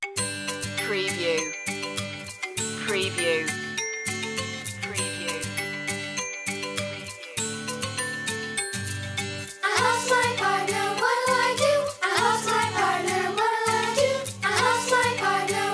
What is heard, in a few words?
skip to my lou children group vocal